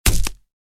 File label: Fire Weapons Sound Effects bullet hit body